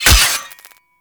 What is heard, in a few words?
Melee Axe Impact Robotic Flesh; Weapons; Impacts; Axes